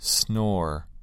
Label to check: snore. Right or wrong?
Right